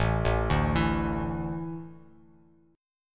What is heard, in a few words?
A Suspended 4th, Bass Standard; Asus4 arpeggiated; JGuitar